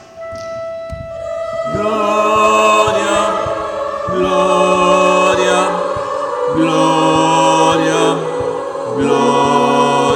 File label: DingDong Gloria, BAS; finale